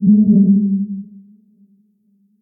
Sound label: MOLOCH damage